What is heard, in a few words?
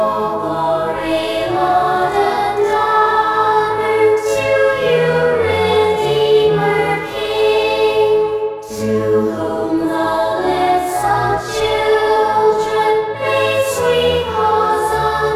choir